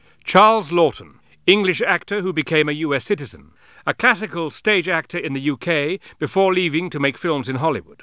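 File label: laughing; 20th Century Newsmakers; Scarlet Software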